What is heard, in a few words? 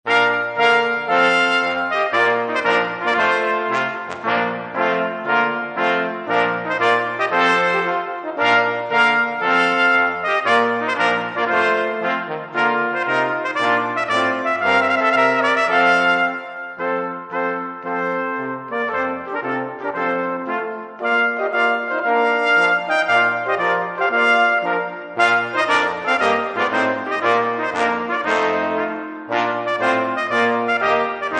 trumpet